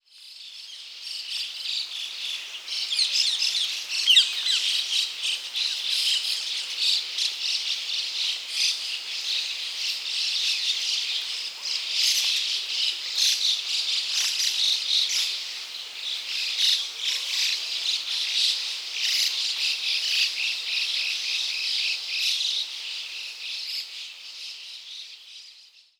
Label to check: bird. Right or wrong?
Right